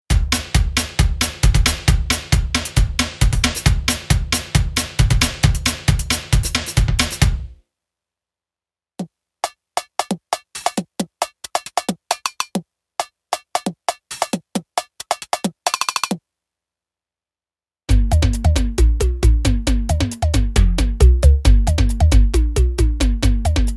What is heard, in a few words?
drum loops